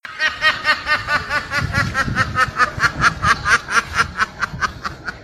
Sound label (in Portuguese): risada